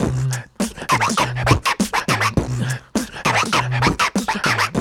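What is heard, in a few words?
108 bpm, scratch groove; Scrth Groove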